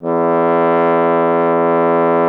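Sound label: bass trombone; Miroslav Vitous; Solo Instruments VOL-1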